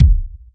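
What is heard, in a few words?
kick, Boss DR-202; Drums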